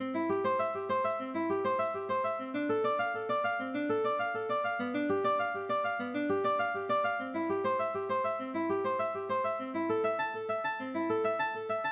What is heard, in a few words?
bach prelude, mandolin